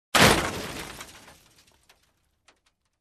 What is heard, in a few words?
Minor Rock Explosion